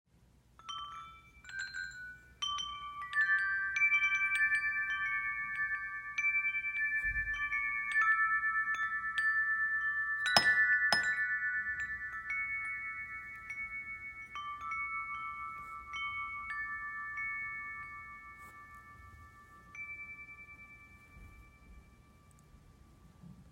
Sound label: windchimes 2